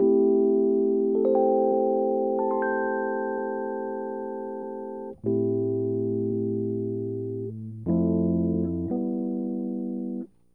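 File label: rhodes, A minor, Hip Hop & RnB; Saturday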